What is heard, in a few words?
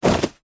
clothing jeans denim pants trousers drop carpet; zapsplat